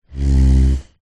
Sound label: household chair slide wood floor rub squeak